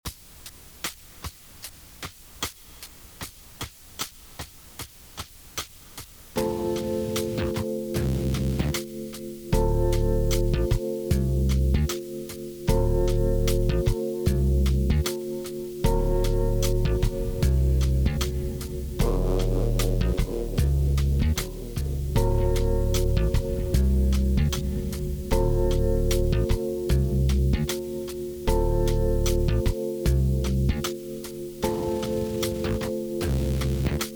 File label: 1 8 notes microtonal funk